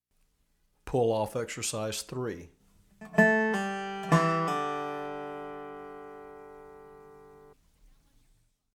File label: Pull Off Exercise